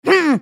spell cast effort; Heimerdinger

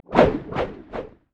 explosive cask; LoL; gragas

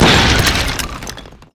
death; LoL; dinosaur chogath

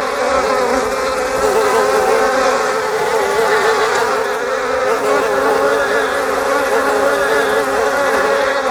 flies loop; LoL; twitch

